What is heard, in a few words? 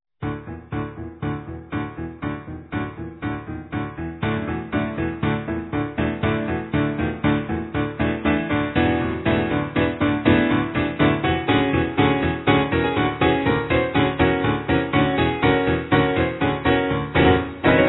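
Forte Piano, "August Forster" Grand Piano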